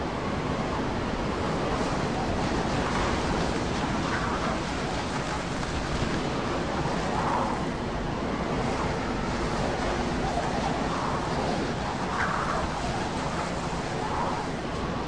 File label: wind strong